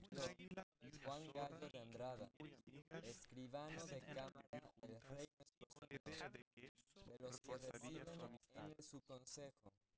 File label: male; SiSEC11; underdetermined